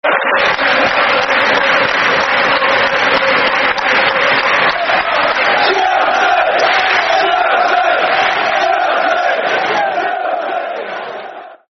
10 Men Went To Mow, Football Chants (Soccer Song); Chelsea; fanchants-com

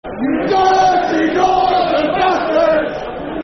Football Chant; Bristol Rovers; fanchants-com

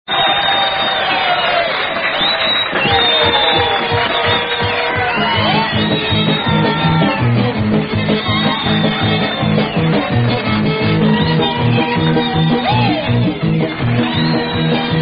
Feudin' Fiddler [Instrumental]

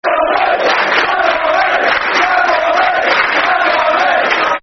Football Chant; Claret And Blue Army; Aston Villa Avfc

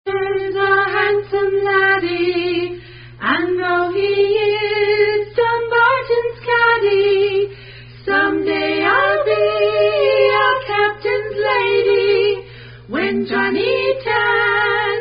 Drums; Dumbarton's Drums

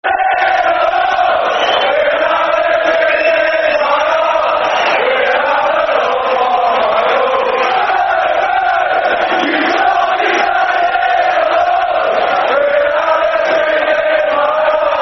Football Chants (Soccer Songs); Man City Mcfc

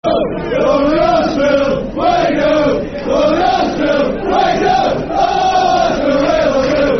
We Love You Huddersfield, Football Chant; fanchants; Huddersfield Town Htfc

